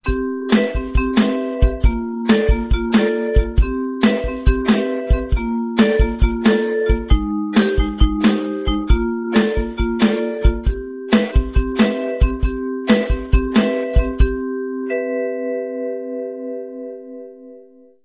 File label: instrument